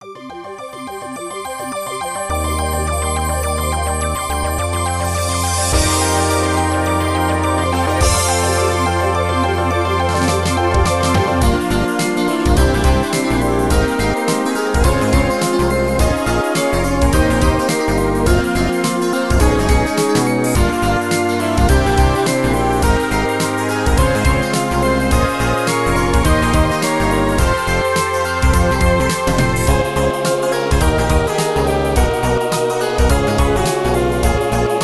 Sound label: (Ending); Dimahoo; CP System II; arcade